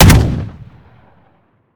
weap fire; weapons; gmod

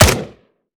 akilo47 smg; weapons; viper

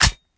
plr sm beta reload xmag mag in; gmod

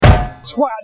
hit head; bmw isetta

